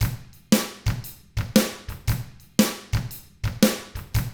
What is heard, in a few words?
SHUFFLER, Drums; ILIO; Double Platinum Drums 1; 116 SHUFFLER